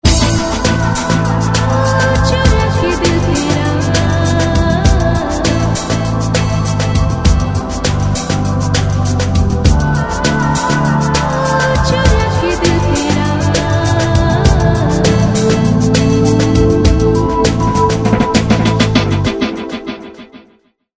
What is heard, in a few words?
Clear Surface, ringtones; Motorola ROKR EM35